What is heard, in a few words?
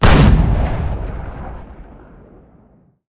aa fire big, guns